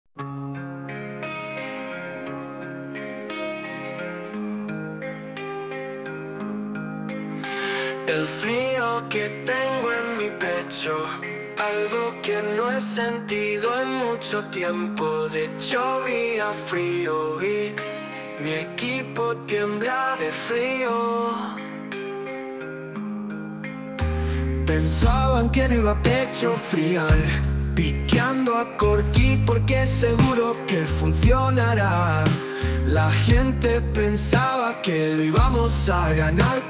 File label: Indie Sad Slow Español